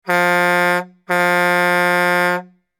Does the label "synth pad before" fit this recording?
No